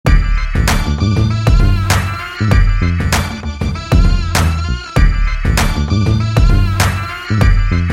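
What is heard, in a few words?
background music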